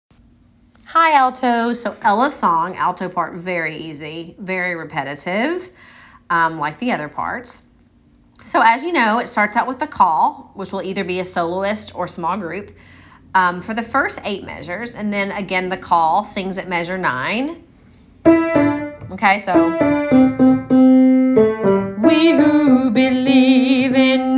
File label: Alto